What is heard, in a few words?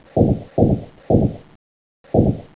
disaster; ambience